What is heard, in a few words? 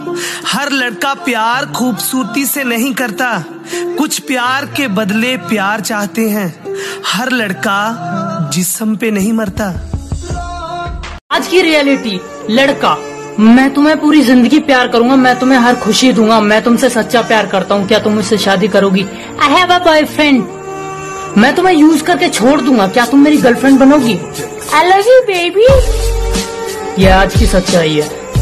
bollywood ringtone